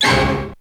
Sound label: hits; xr10